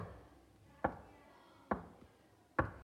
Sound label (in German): klopfen